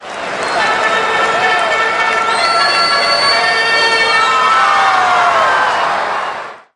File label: baseball charge